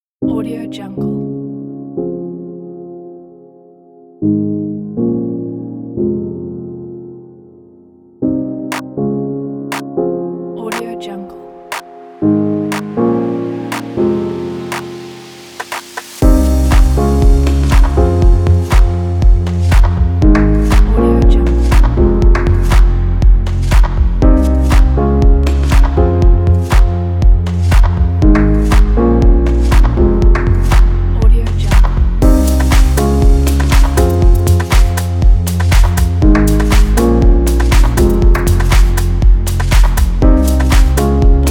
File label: deep house